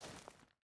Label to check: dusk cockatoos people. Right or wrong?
Wrong